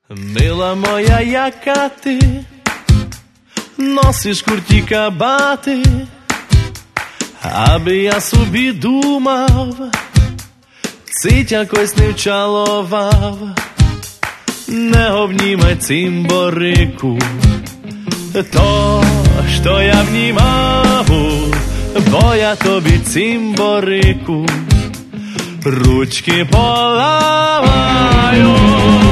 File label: rock